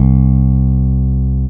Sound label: bass c